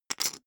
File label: poker chip stack small rattle